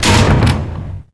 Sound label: lever; hl2